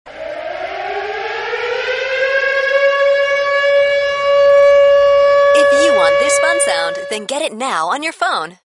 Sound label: Air Raid Siren Alert